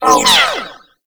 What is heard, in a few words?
Corpus Energy Proj By